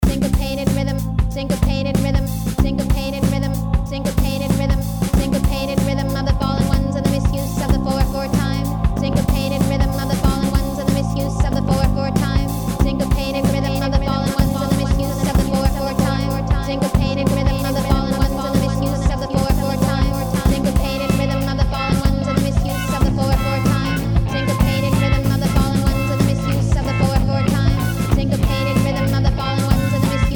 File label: Syncopated Rhythm